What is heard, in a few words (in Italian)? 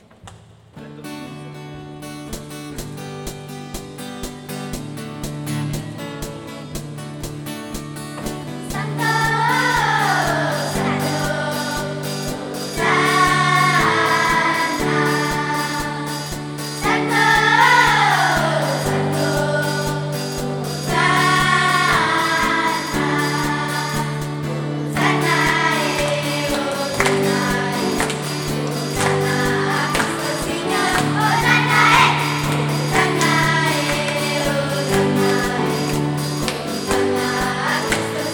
santo country